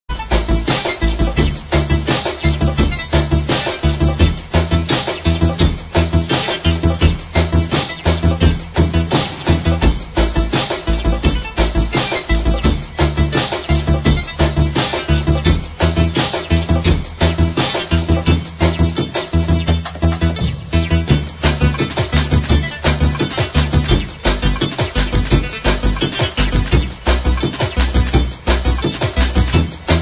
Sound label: d&b electro rmx; Spellbound